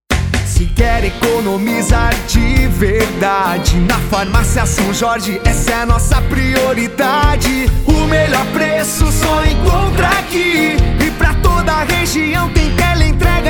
Jingle Farmacia; Sao Jorge

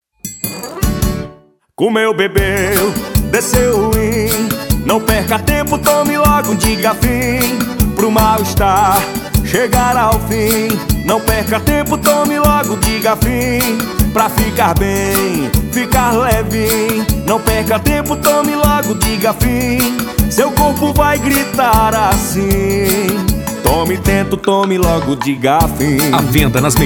Jingle; Digafim

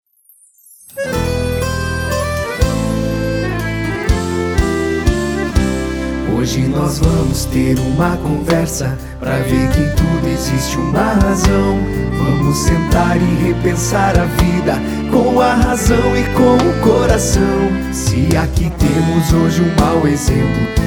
Jingle Renovacao; Daniel e Quiliano

